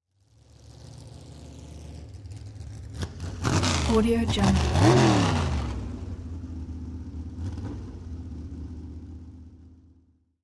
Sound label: dragster pulls up to starting line; sound-ideas